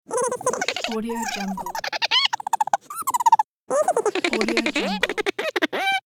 squirrel laugh

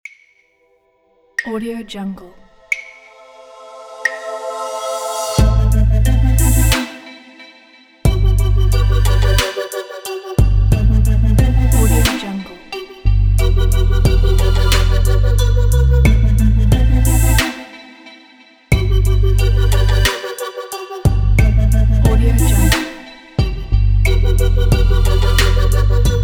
downtempo scifi trap

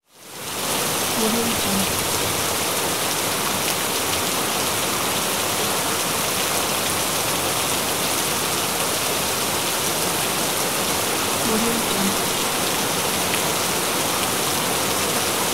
rain

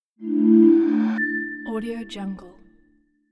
minimal short abstract light logo reveal; mylesproductions

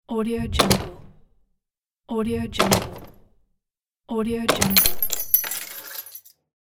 close door

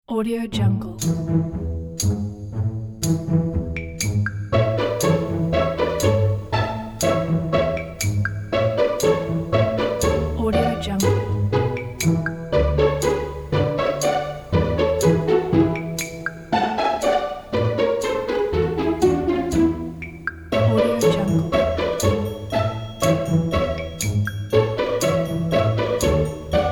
whimsical comedic pizzicato strings

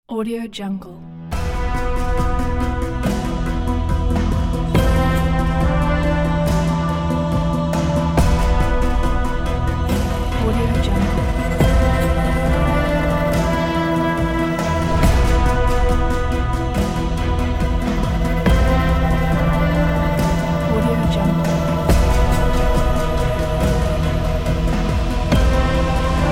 dramatic intro suspenseful

